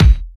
mid range kick drum single shot A key, kick drum tuned to the A note